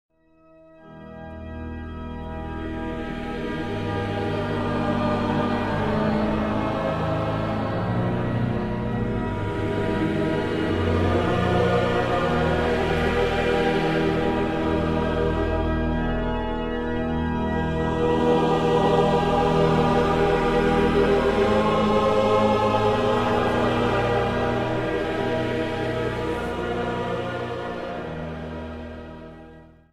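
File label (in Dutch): Psalm, orgel; Nijmegen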